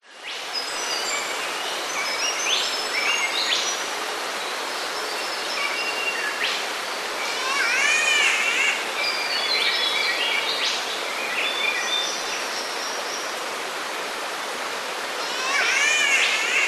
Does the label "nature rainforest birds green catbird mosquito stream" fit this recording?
Yes